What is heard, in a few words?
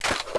clip out, weapons Barrett